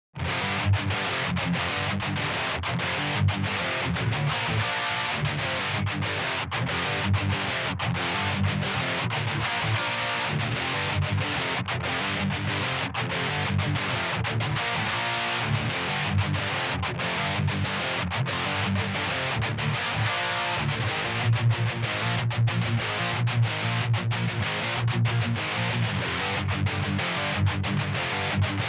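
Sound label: guitar; cube at home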